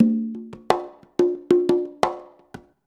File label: CONGA, Modern Percussion Loops